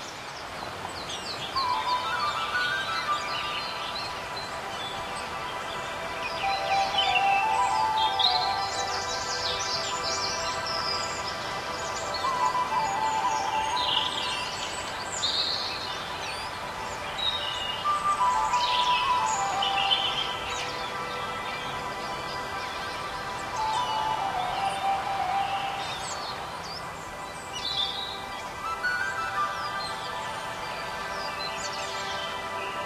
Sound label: St. Patrics Ambience; Angry Birds Seasons